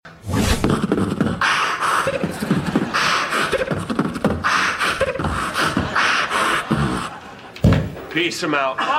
Beatboxing; Ted Mosby

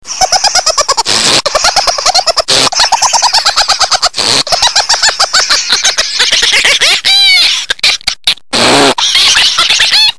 Hahaha

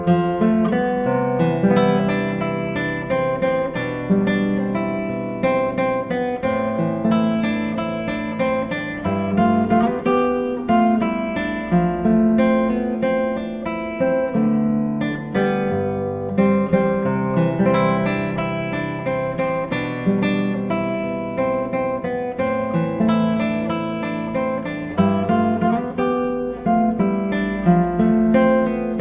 Classical

